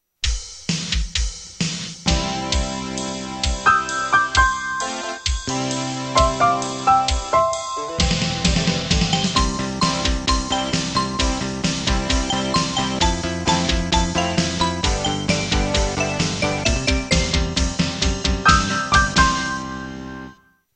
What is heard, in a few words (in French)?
instruments clavier electronique